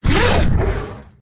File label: attack, she; combat; creatures; ryuchi; CosmicRage